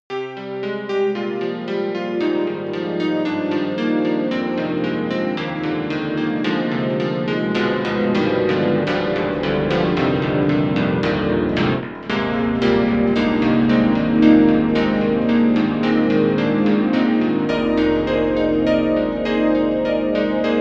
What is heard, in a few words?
KORG KRONOS 2 Piano